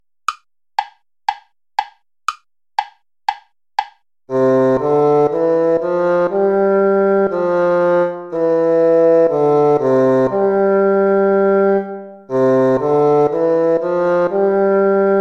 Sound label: gavotta